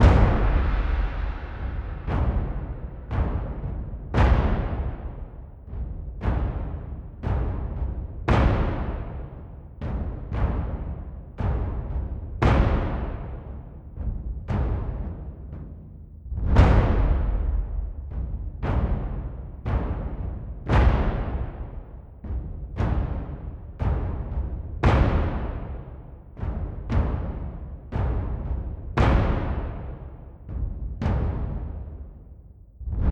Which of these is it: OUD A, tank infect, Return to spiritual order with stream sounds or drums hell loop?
drums hell loop